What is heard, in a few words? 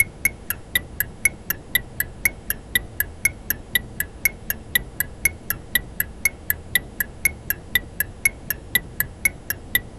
the crisp metallic tickin, music box